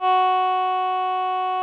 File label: VP-330, String Machines